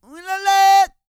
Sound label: SING, World Voices, CARIBBEAN